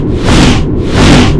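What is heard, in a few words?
Dragon Attack Skill Loop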